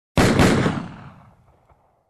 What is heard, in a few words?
Shotgun fire twice